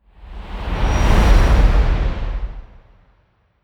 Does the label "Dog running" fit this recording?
No